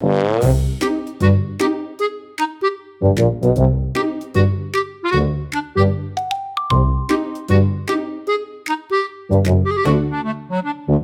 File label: sneaky pirate bounce